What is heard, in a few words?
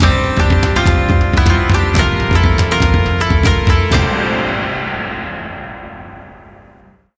Match Win; Piston Hondo